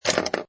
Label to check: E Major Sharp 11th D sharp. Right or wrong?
Wrong